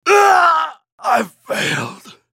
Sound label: dying; Lucian